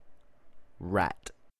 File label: Rat